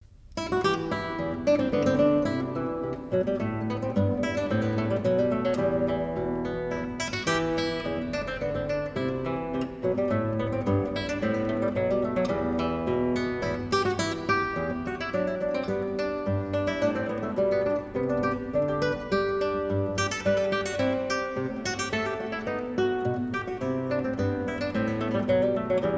Hornpipe Arr for Guitar

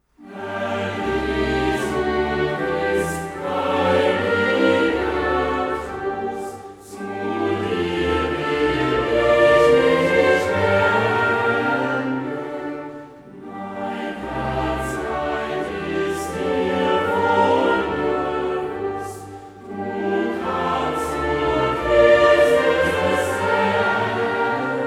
Choral

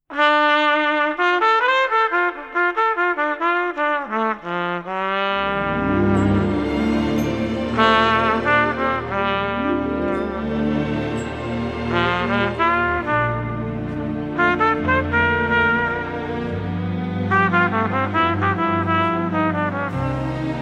Strings Orchestra Trumpet

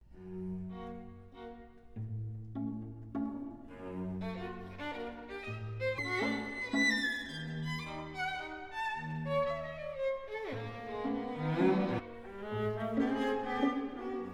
String Quartet, Allegretto un poco giovale

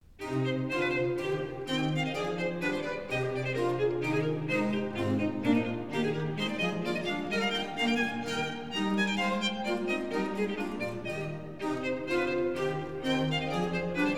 Concerto in A minor for Oboe and Strings Allegro

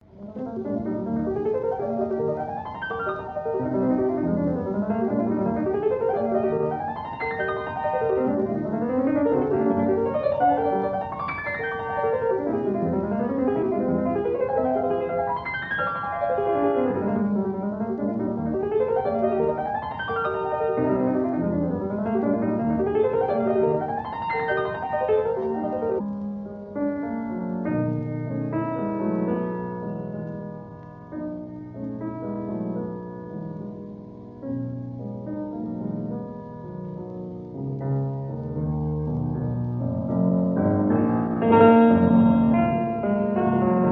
Piano Sonata in B minor Scherzo Molto Vivace

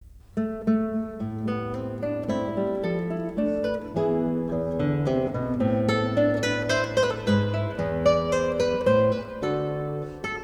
Suite in A Minor Courante Arr for Guitar

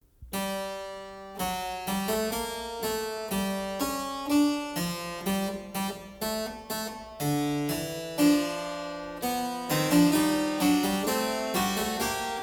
Prelude and Fugue in B Minor

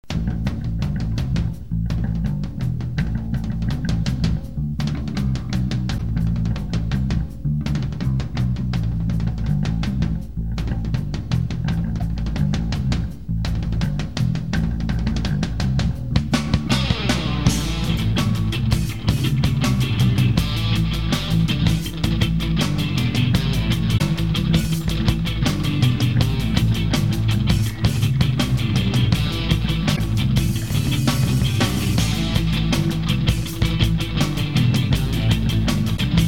Instrumental

